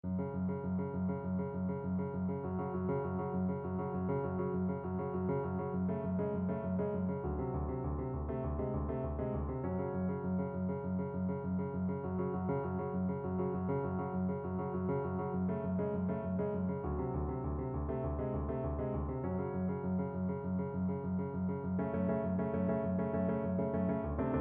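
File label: VLC karaoke, Cello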